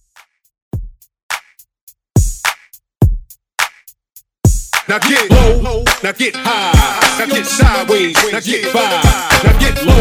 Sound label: Hiphop Hype Redrum